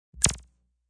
Lazer Sound; Laser Sound

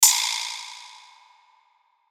Hit Tambourine Rattle Reverb; Blastwave

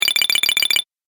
Game App Coins, Video Game Sound Effects

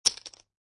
Poker Chips Dropping On Poker Chips; Poker, gambling, chip: Poker chips dropping on poker chips, clicking; Lynne Music

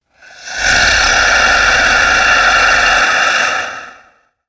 Alien Hiss, Scifi, Alien, Hiss, Science, Fiction; Aliens (Sound Effects:Science Fiction); SoundEffects-BlastWave

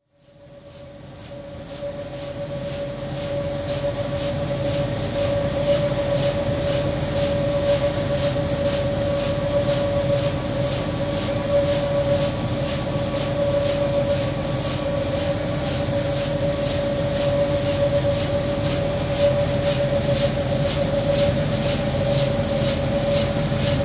Brewery, grist mill; Restaurant; BBC

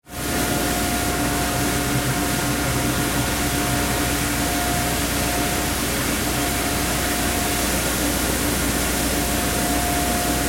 Ship Oil Pipeline Service Vessel Compressor Whine

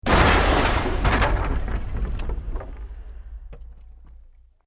EXPLOSION MEDIUM WOOD

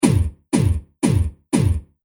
120 Bpm Loop Mutant Kick Machine; Percussive And Rhythmic; Seamless loop; Drum Loops; Andrew Fleming

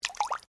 Water Drips Single, recorded in a domestic pool; Various water sounds recorded in a domestic pool; Nature; Foundation4

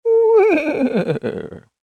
Horse Animal Impersonation; Horse Sound Effects

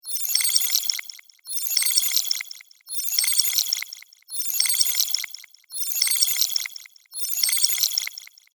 Magical Syrup Loop; Magic Sound Effects; Andrew Fleming; Twistedtracks

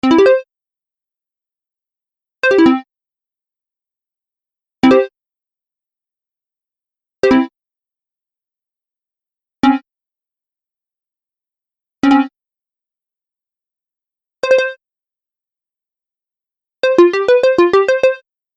Menu Sounds Collection, tech sound effects; sound design

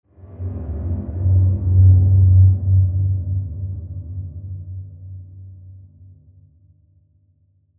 Sweeper Low Deep Reverb Swell; Production Element Imaging Element Accent Transition; Sweeper Sound Effects

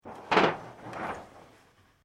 Window Screen Sliding Paper Japanese Open; Household

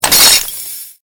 Created Magic Spell Ice Bolt Impact

